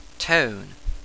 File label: tone; dial tone